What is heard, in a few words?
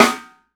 RMSHT, 50S JAZZ KIT; Northstar - New Gold VOL-1